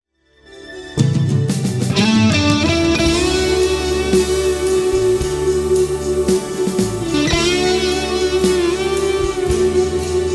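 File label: Solo Celestion 75, guitar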